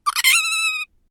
Little Critter In Pain, Monster Sound Effects; horror

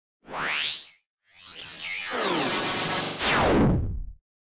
Digital Distortion Left To Right; Digital Distortion Power Down Left To Right; Digital Distortion Electrical Whine Production Element Imaging Element Accent Transition

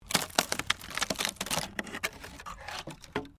WOOD PLANK TWIST

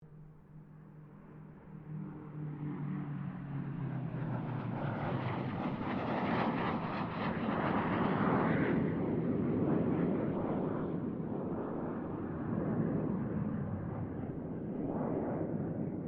AIRCRAFT GLOSTER METEOR F MK8 PASS DISTANT; Gloster Meteor figter jet; Airplanes (Sound Effects:Vehicles)

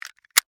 Seat Belt Buckle, Automobile; Transportation; Cars

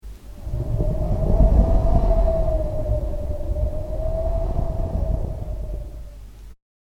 As The Cold Wind Blows, horror soundscape; Scary Soundscape Sound Effects; sinister halloween

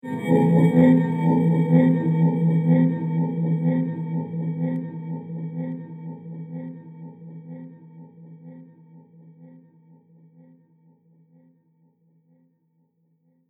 Scifi Movie Amazing Transition; Sci Fi Sound Effects

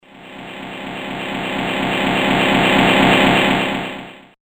Slow Gritty Power Whoosh; Andrew Fleming

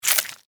Squish Suction, Wet, Juicy; Horror; Blood and Guts

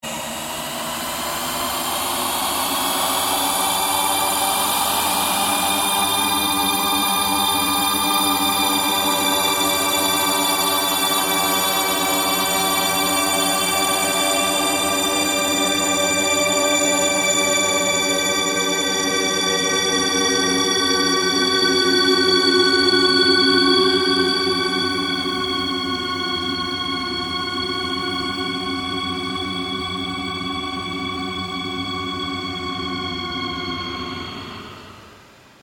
Ambient Drone Slow Sweep

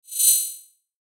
Shimmer Swell Metallic; Multimedia; Blastwave

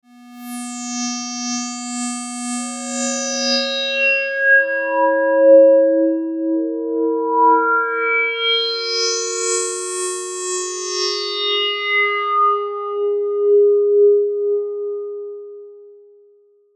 Sweeper Musical Melody Pan Synth; Blastwave; Production Element Imaging Element Accent Transition

